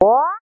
multimedia game bounce jump

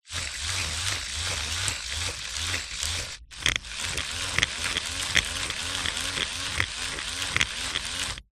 leisure toy remote controlled spider motor whirr

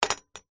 industrial tool metal spanner or similar set down; zapsplat

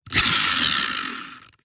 leisure rc car wheel force spin by hand

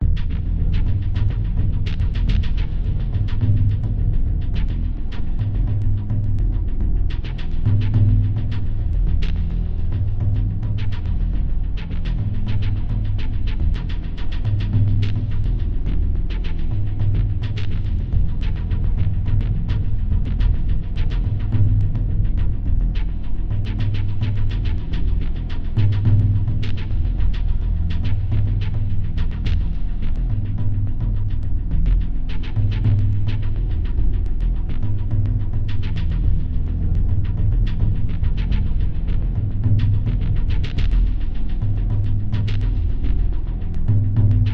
night crawler; zapsplat

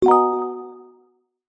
multimedia notification mallet synth dreamy

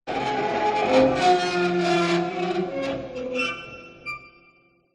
horror accent metal scrape dark processed scary

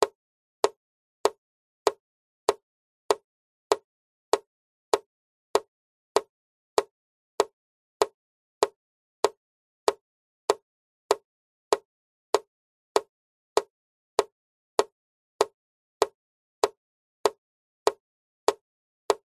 nature water drips steady med plastic hollow

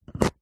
foley tin very small circular lid open; zapsplat

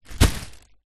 apples bag drop into cardboard box

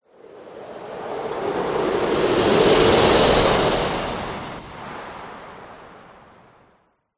fantasy magic wind whoosh spell; zapsplat

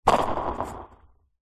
sport skateboard wheel spin air by hand; zapsplat

